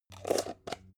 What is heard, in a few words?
jar plastic midsize close